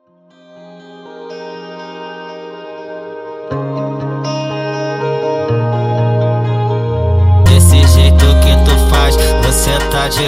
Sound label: Funk speed Up